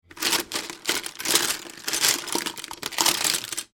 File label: office pencils pens rummage; Rummaging Through Pens And Pencils In Tub